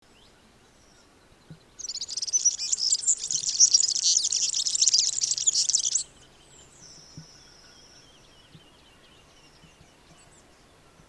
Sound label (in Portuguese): chamariz